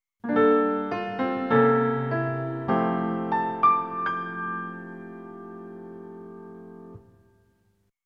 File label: Game Over; NINTENDO